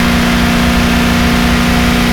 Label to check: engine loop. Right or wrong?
Right